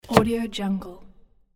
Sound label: cutting vegetables